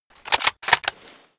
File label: AWM rechamber, sniper rifles; 308 MSR